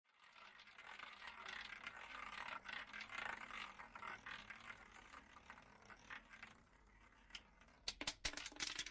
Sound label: roulette